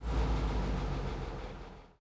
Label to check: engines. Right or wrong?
Right